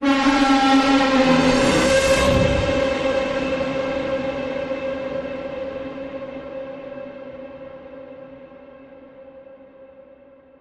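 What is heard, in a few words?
creature; Relic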